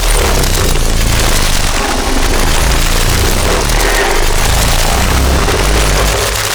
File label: Sentient Vessel Laser Loop; Warframe; Tau; Abilities